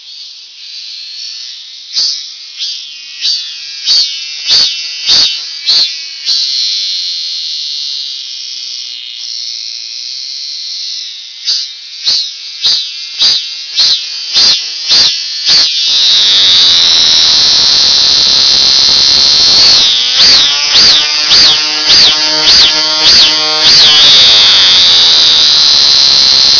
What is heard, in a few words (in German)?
grillen; die krabbeltiere in china